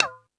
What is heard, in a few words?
Tool Kit, Drum Machines